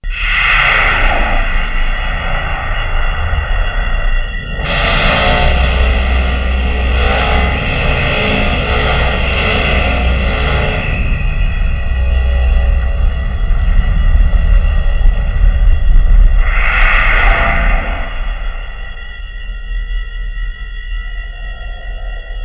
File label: moon door, Synth